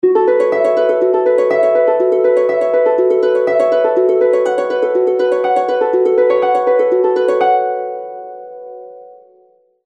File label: Moving Alarm, Harp; HTC